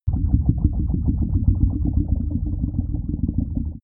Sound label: digital idle smooth gurgle; atmosphaeren